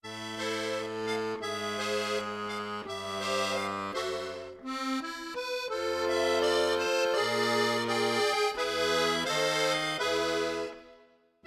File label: Accordion; legend of zelda the breath of the wild the champions ballad